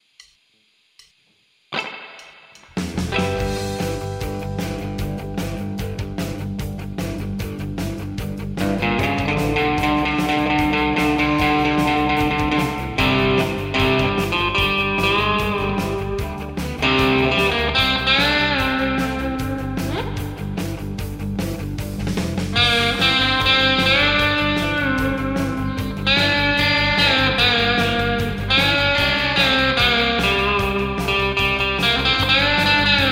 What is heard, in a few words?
black thunder rock, guitar